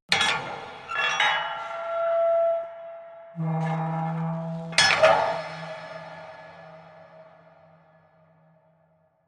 Heavy iron dungeon door being opened and closed with atmospheric ambiance; Metal Doors